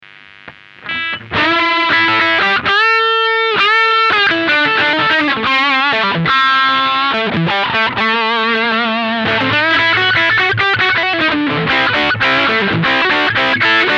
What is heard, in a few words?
LP R8 PRX908 Amplug Classic Rock Lead